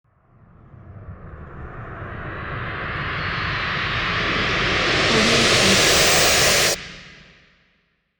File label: riser